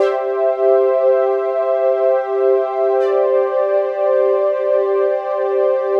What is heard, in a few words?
Squid Pad, ambient